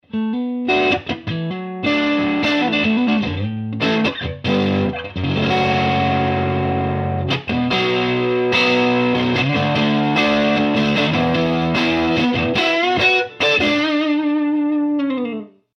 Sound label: overdriven